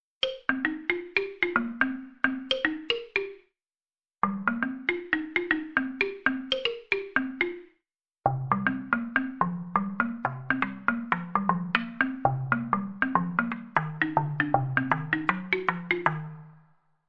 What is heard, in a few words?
African Marimba Verb